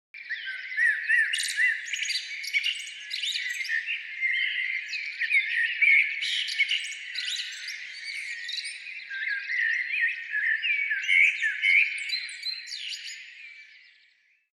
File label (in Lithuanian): pauksteliu namelio garsas